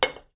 can to table

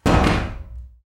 bang, mechanical sound effects

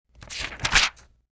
page turn

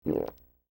gulp

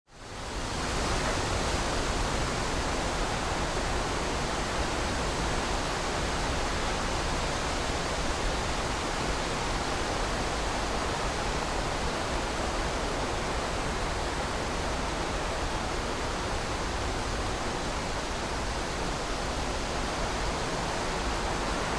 wind breeze, ambience sounds

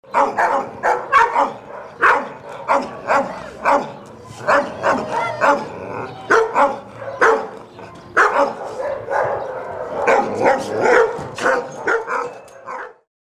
dogs barking

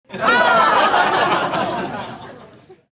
crowd laugh

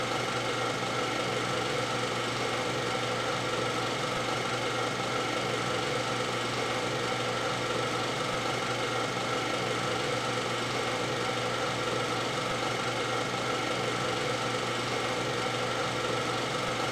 film projector, machine sound effects

